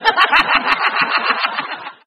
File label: hahaha